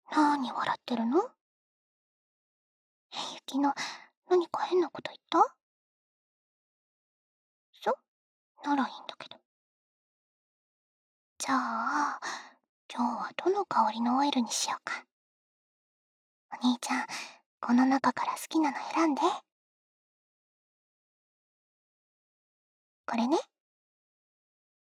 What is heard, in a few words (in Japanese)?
オイルで耳マッサージ; 雪乃編; 雪乃の必殺技